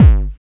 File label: HD BD, Hard & Loud Techno; USB Soundscan vol.01; AKAI